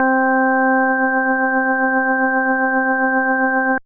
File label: Organ C5, Old Skool House